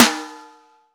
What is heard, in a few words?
SNR, Roland; Real Snrs